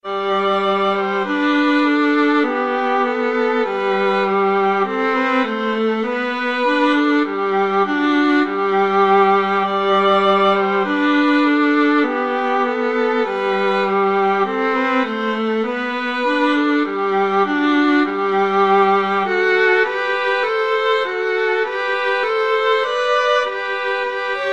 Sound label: deck the halls, violin viola duet